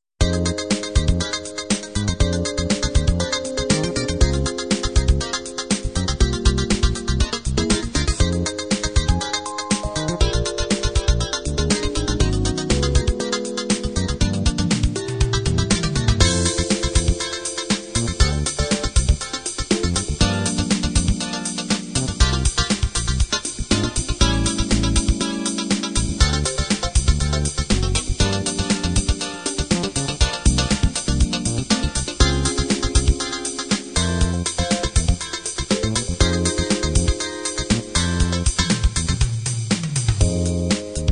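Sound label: standard 16 beat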